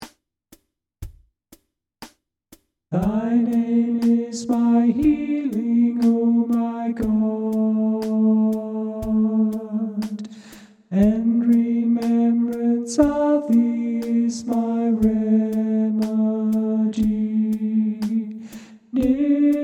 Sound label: tenor, choral music